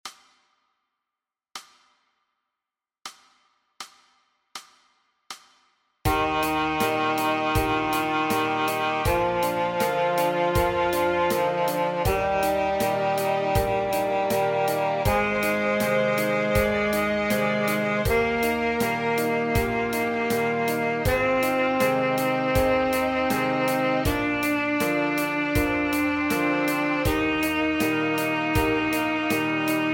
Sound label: C Scale